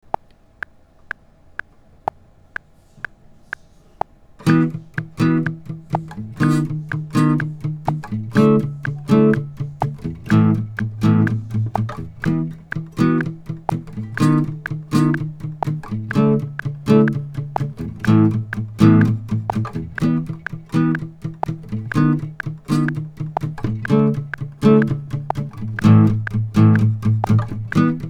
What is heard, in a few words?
click track exercise